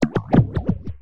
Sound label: loop, techno atmos